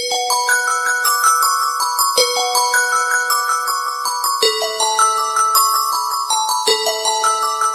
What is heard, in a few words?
Galaxy Note, nada dering; samsung